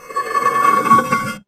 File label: coffin open; Horror